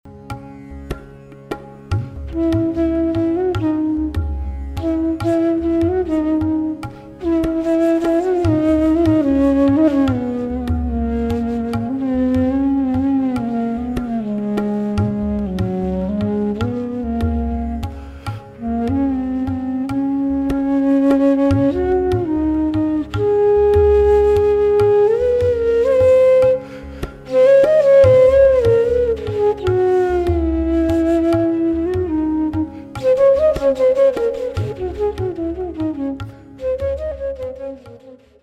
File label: Addha Tal Miyan Malhar C sharp